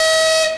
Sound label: Klaxon; OpenBVE